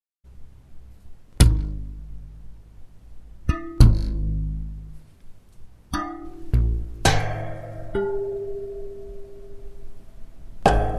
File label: chance music